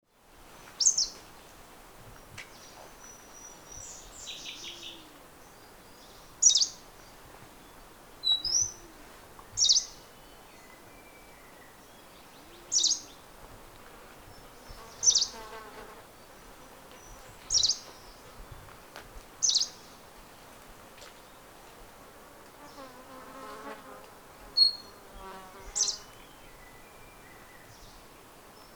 Green Warbler, Horton Plains

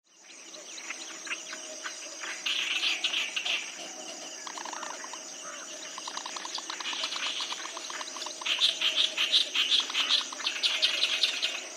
Clamorus Reed Warblers; Sri Lanka

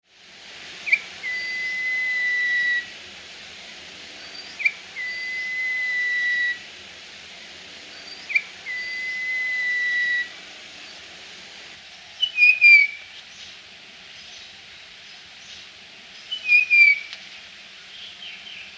Common Iora; Sri Lanka

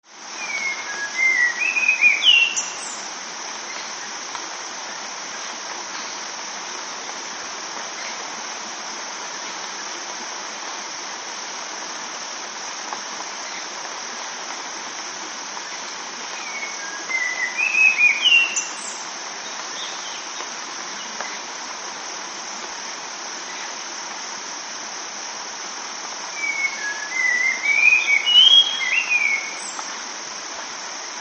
Spot winged Thrush at Sinharaja; Sri Lanka

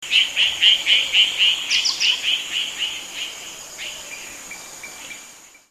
Red vented Bulbul alarm call; Sri Lanka

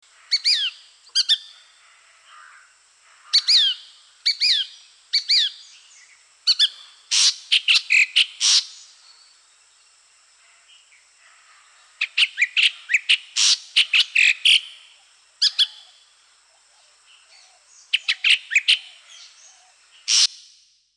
White bellied Drongo mimic Shikra; Sri Lanka

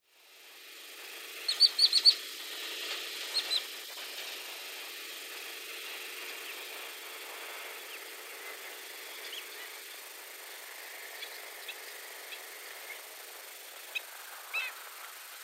Weerawila, sri lanka nature sounds; Animals heard in the soundscapes

